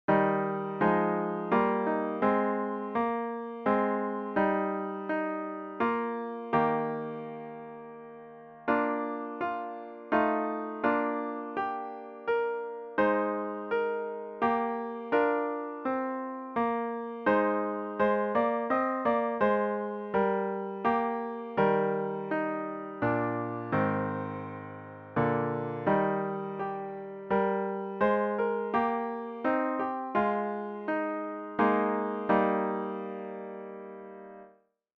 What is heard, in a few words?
be thou my vision piano